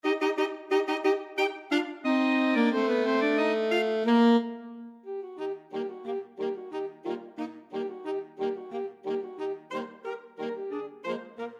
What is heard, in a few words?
saxophone ensemble; radetsky march